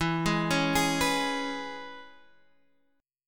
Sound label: C sharp Minor 7th E, chord